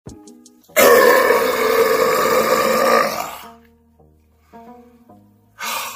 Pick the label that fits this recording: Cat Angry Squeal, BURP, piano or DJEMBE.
BURP